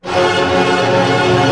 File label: ARP C MIN FF, STR ARP; Sound On Sound; Peter Siedleczek's Orchestra